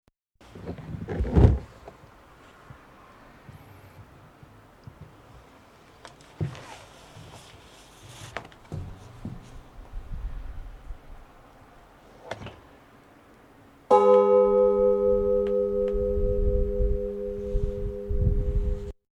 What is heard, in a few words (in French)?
cloche; St Paul de Salers, cloche 4